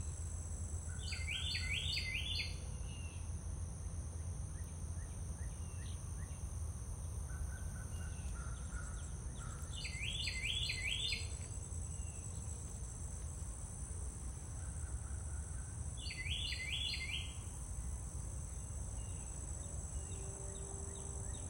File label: cardinal calling, field recordings; scout island